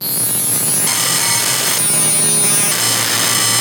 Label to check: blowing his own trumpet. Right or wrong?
Wrong